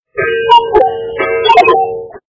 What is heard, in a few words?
Early Morning Tone